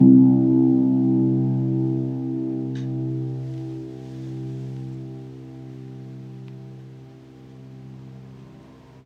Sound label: Upright Home Piano C1; Multisamples; Ableton Live 12 Lite; Core Library